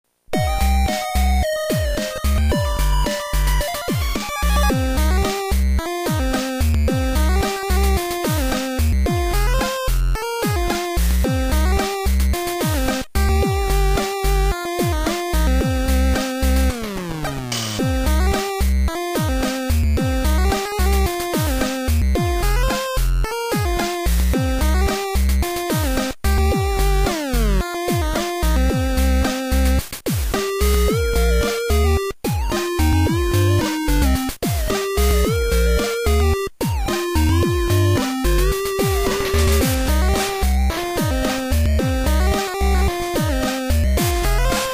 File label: chiptune remix; The Amazing Spider-Man